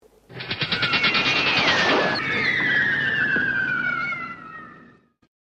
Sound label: bionic throw